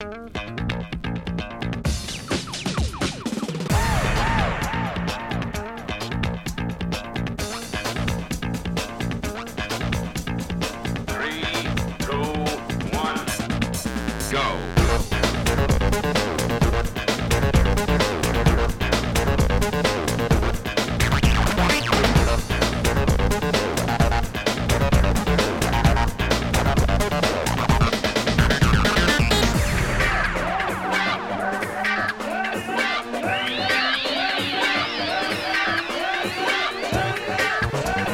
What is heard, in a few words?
stock music